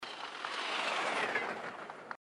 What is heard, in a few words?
passing train; Subway Surfers